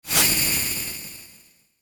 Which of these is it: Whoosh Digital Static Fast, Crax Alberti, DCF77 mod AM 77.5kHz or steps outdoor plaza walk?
Whoosh Digital Static Fast